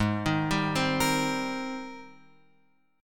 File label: Gsharp Major 9th; G#M9 Chord {4 6 5 5 X 6} arpeggiated